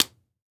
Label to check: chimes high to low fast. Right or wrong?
Wrong